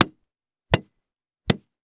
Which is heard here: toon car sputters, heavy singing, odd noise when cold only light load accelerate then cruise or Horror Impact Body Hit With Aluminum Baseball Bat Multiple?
Horror Impact Body Hit With Aluminum Baseball Bat Multiple